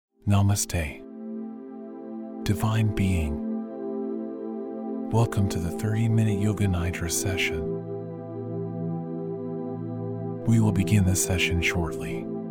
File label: 888hz Abundance Gate Masculine